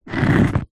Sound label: stone large scrape on rock